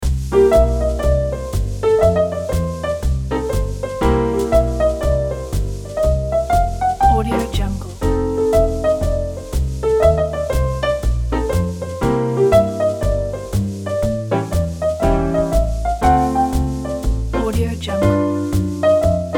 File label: piano jazz blues; ryumusic